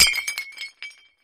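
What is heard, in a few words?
Dish Ceramic Bowl Crash, housewares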